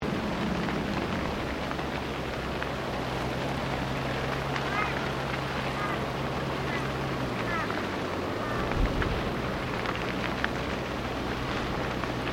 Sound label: Bushfire Distant, small bushfire; Arial firefighting